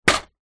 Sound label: vehicles bicycle small childs drop down on others